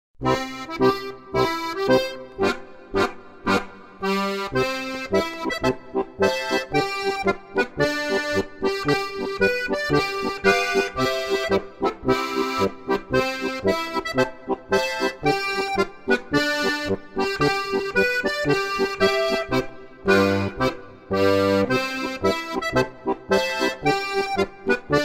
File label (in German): Tiroler Holzhacker Marsch